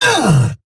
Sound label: Pain, Battle Barks; Warframe; Eidolon Grineer